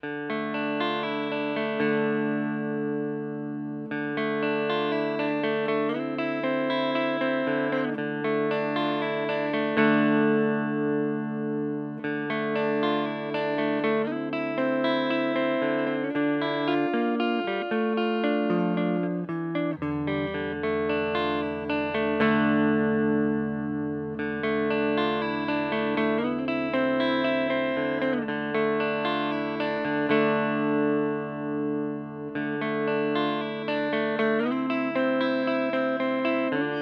Hagstrom Ultra Max Neck Clean

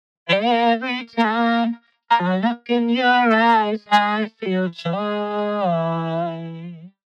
Vocals Vocode; Antares

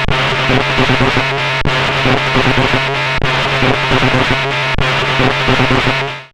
SOMA ROAT Shredding Guitar Imitate

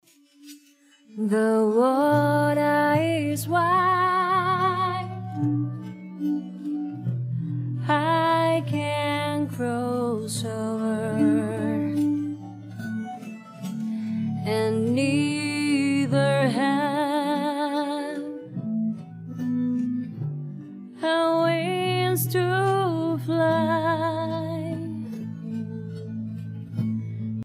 Vocal Only; Roland

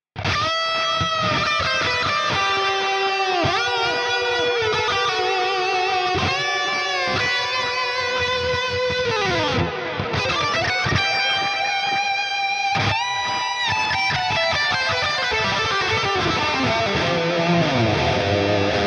Flying Solo; Archetype Abasi

